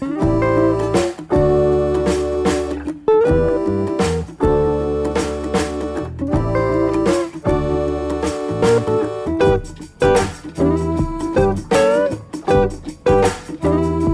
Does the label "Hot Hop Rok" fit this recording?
Yes